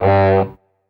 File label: Comedy Low Honk